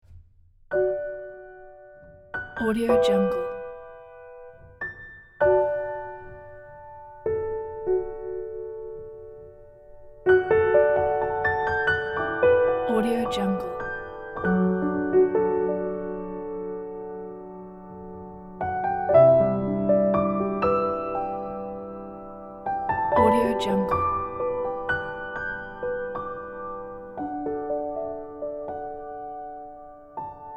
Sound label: tender morning solo piano